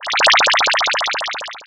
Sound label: gameover; deckentetris